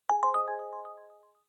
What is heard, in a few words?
hint